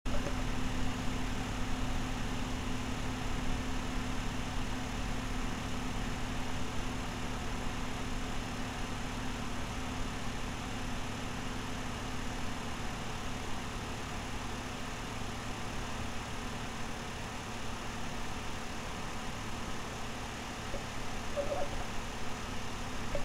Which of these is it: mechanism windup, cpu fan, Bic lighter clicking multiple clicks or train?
cpu fan